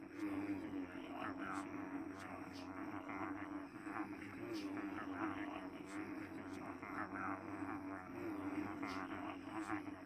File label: Crowd murmur; Zote; Hollow Knight